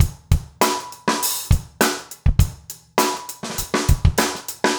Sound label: basic funk